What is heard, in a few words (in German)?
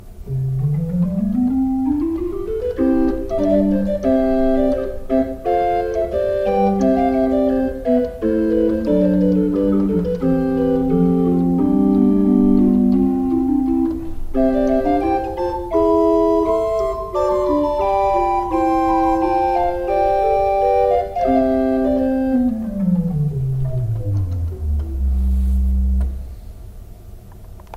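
Grenzing 7 II P, pfeifenorgeln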